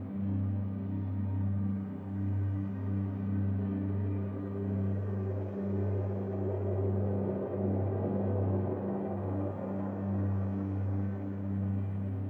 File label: space ambient; Stellaris